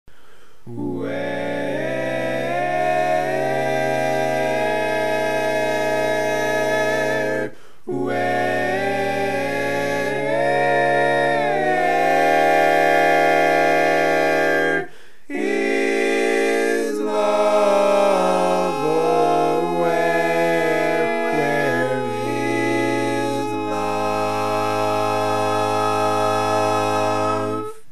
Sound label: Bass, barbershop